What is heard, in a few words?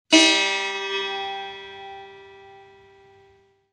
setar mi